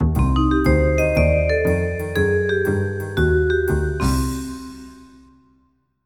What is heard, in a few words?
cool jazz